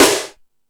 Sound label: All Snares, E-MU Pro-Cussion